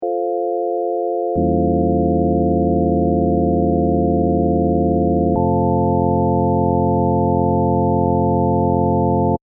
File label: FM E dim7 to FM